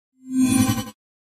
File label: Pop Up HF Elect Whip Tr, pop up element